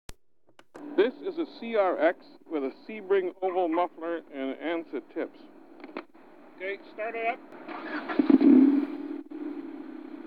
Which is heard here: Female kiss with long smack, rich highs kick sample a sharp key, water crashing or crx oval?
crx oval